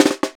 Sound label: Caixa Roll, Percussion; Roland BK-5